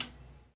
tihij ele zametnij zvuk vklyucheniya

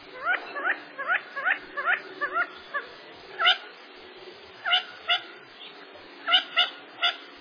nervnij krik perepelki u avomobilnoj dorogi

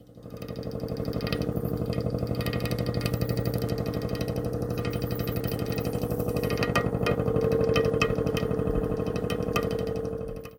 zvuk vibracii kostryuli

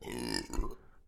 otrizhka

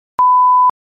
zapikivanie korotkii zvonkii

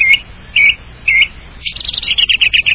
penie drozda v prirode

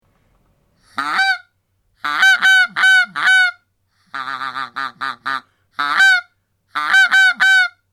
trebovatelnij gogot odnogo gusya

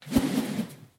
zvuk vrasheniya verevki bolshogo razmera

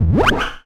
knopka cifrovoi voshodyashii shumnii zvonkii rezkii

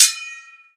mech zvonkij visokij v prostranstve

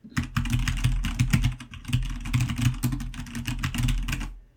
nazhimaya na klaviaturu sozdaetsya vpechatlenie chto vi bistro pechataete

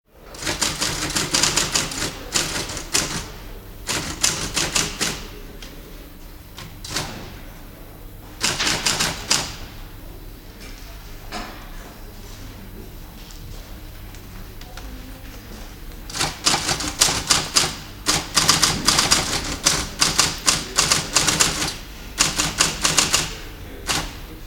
pechatnaya mashinka

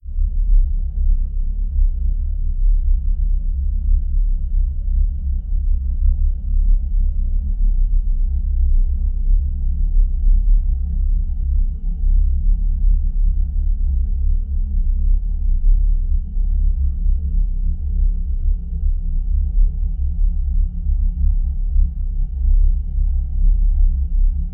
nizkij prodolzhitelnij ele slishnij zvuk

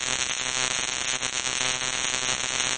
pomehi dalekij monotonnij rezkij

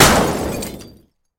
korotkij grohot ot bitogo stekla

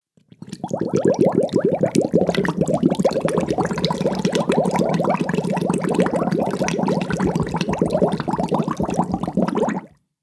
podvodnie puziri vipusheni

